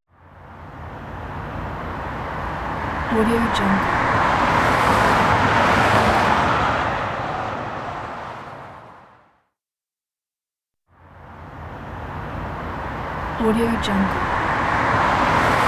several car pass by

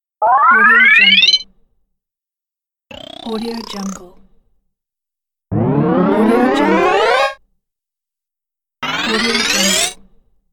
increase percents statistic fx

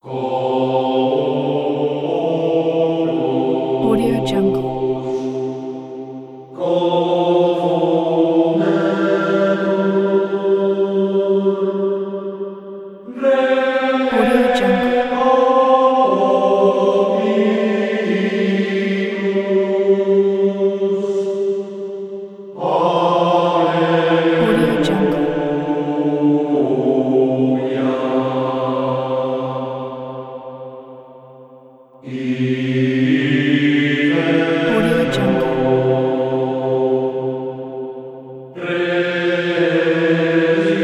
gregorian monastery chants

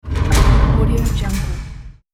heavy metal door closing

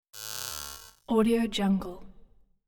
scifi button beep; hollywoodedge

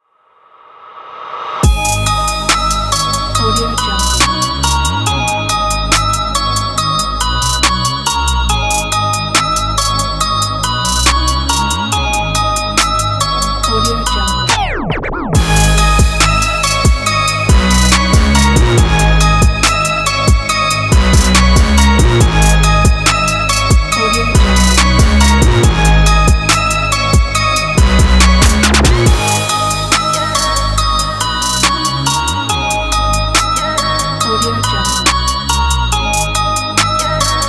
trap hiphop

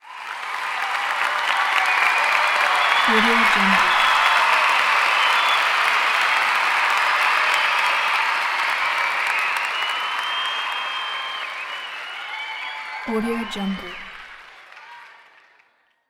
crowd cheer

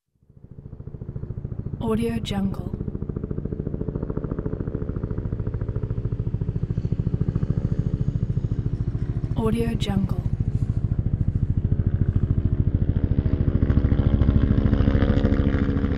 military helicopter

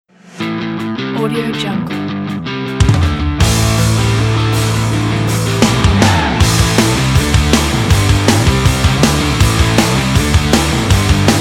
punk music

